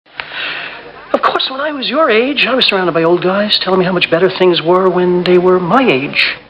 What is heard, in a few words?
flutie